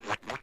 step; Standable Full Body Estimation

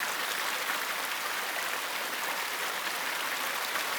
river medium; background

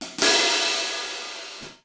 Hihat Open and Close, drums

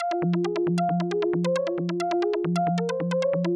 Ping Arp; Sony; ACID Pro 7.0

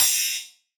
FX Metallic; One Shots; Drums; Unsaned; FX Hit; Ableton Live 12 Lite; Core Library

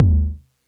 Tom; Ableton Live 12 Lite; Core Library; Drums

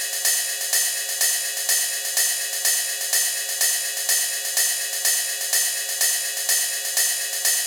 Hihat Techno Straight 125 bpm; Loops; Drums; Top; Ableton Live 12 Lite; Core Library

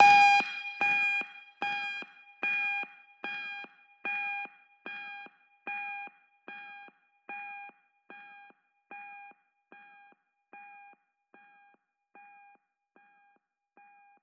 Heart Rate

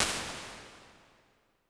Plates Digital Rich Plate, impulse responses; Ableton Live 12 Lite

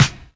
Snare Vinyl, drums one shots; Ableton Live 12 Lite; Core Library

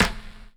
Rim Dirty Feel

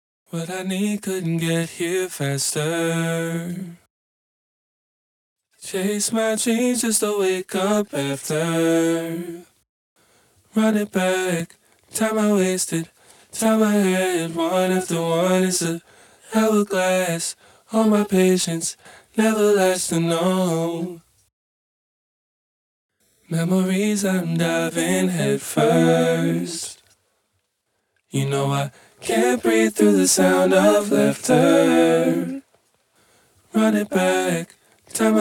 Vocal Harmony; Loops; Tonal; Ableton Live 12 Lite; Core Library

